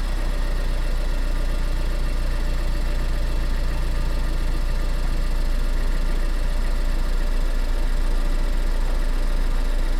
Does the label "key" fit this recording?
No